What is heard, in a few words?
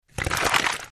Bone Crunch Large Bon; bites